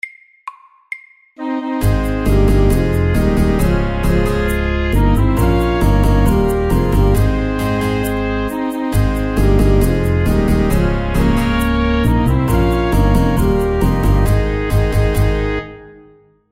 Mariachi band FAST 135

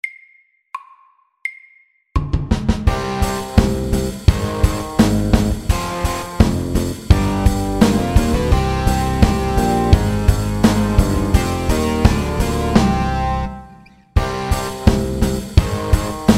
Minirock MEDIUM 85

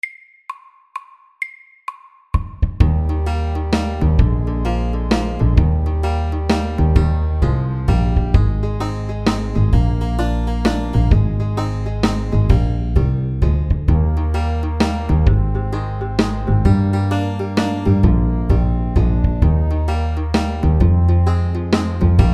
Country shuffle FAST 130

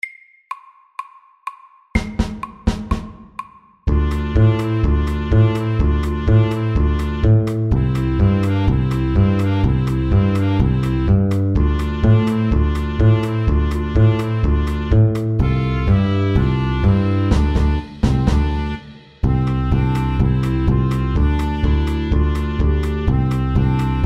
Balkan stomp MEDIUM 125

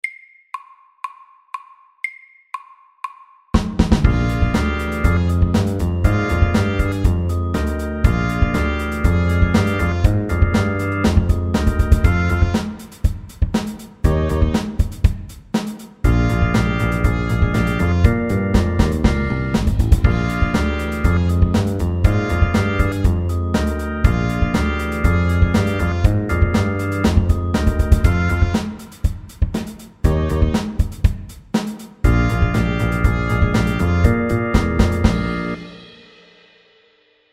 8 bar blues MEDIUM 120

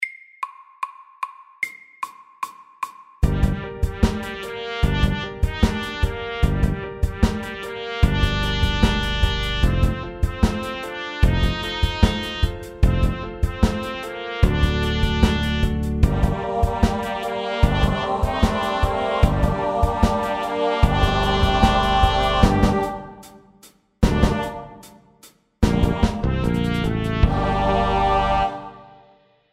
G minor rap FAST 150

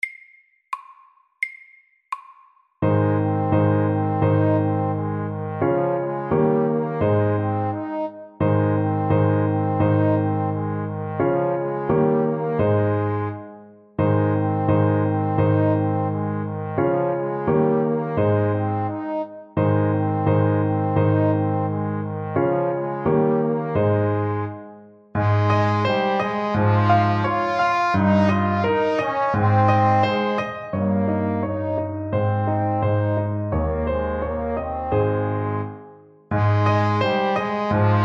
Ecossaise SLOW 86

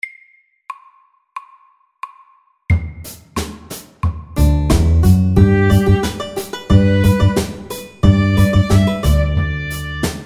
Bluegrass SAKTE

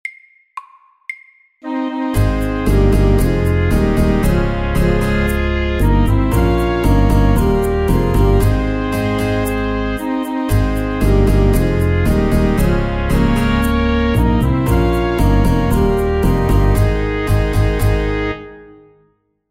Mariachi band MEDIUM 115

